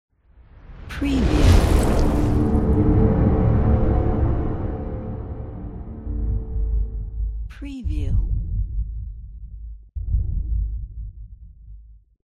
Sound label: dark generation